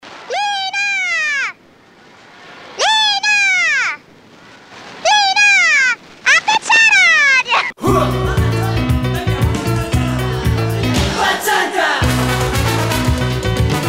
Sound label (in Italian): jingle; primerobasso